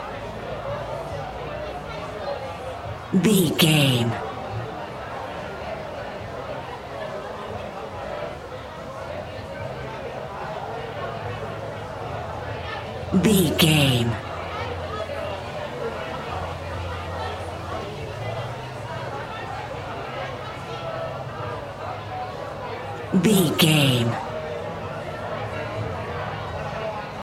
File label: background crowd people chatter loop